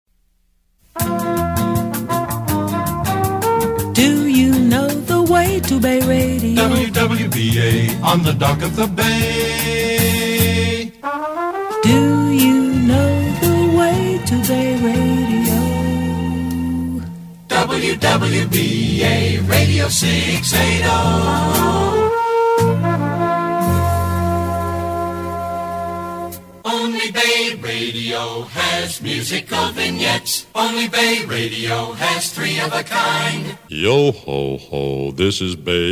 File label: jingle comp; WWBA; AM680